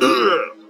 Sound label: punkcroc grunt